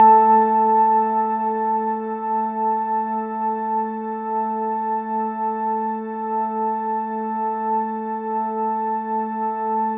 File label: Ambibell a3